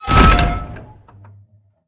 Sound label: palace door open; Fate The Cursed King